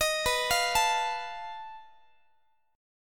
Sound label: B 7th Flat 5th B Guitar; B7b5; arpeggiated